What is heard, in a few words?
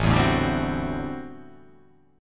A Minor 13th A, Chord; Am13 strummed; JGuitar